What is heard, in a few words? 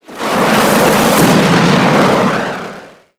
nuke launch; SuperPower 2 Redux V7A